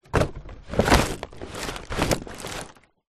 household junk recycling boxes bottles compress push down in plastic trashcan